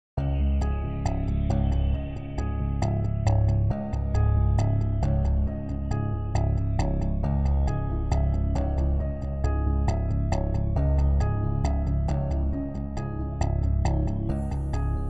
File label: Cold Tension Music